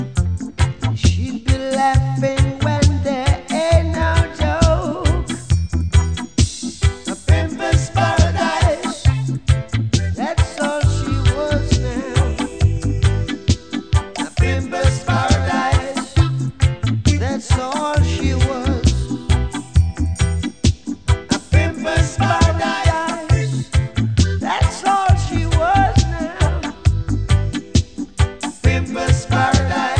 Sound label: reggae